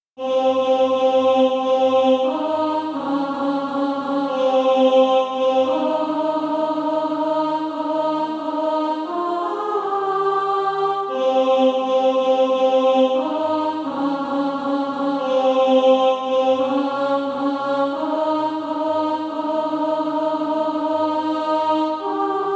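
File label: alto, SATB Practise Music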